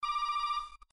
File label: MSN ring